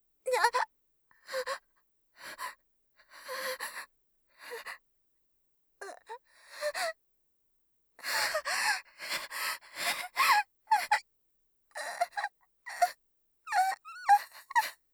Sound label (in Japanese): 胸倉をつかむ; 効果音なし